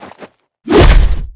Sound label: Snowy Puggy Attack; Seer; GamigoNA